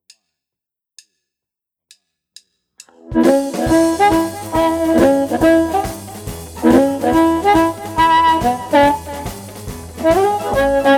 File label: fender pro jr